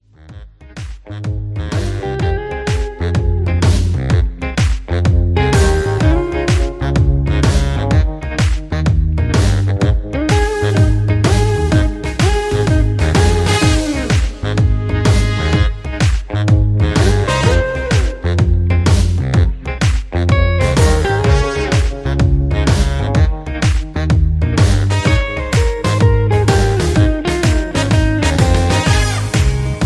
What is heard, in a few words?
Do Wop Hoedown Patter